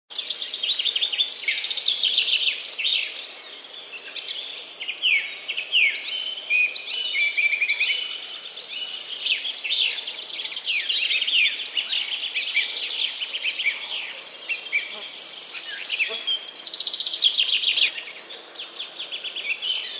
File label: day forest